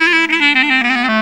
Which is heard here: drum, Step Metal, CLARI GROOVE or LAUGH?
CLARI GROOVE